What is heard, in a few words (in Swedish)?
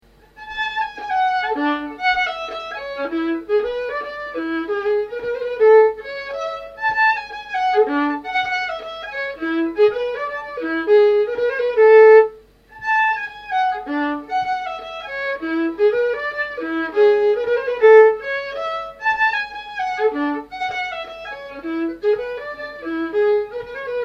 Polska